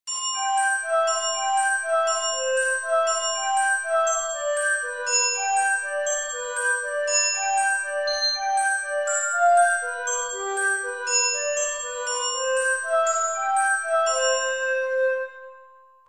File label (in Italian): contrattempo